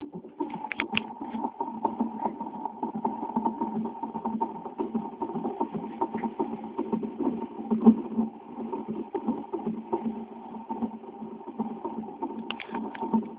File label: heat beat